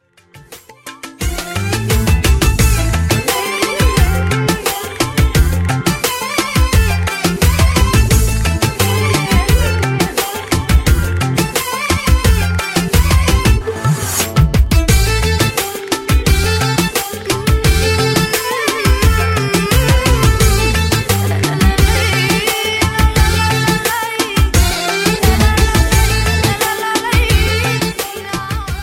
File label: arabic touch